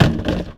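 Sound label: impact rubber small; Cars; XBOX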